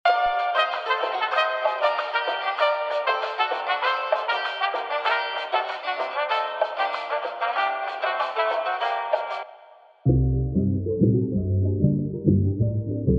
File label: Talk Nice Instrumental